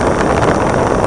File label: Fire Burn; TrickStyle